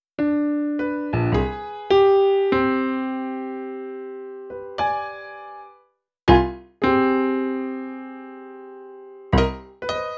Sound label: Piano, cinematic funk